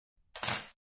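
Metal Bar Tool Drop, Drink mixing tool drops on bar.; Bars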